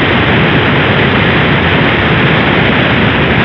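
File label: waterfall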